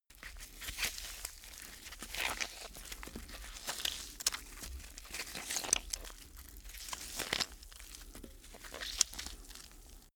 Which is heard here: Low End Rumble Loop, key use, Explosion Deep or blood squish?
blood squish